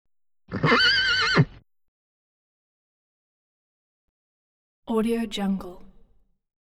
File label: single horse whining; hollywoodedge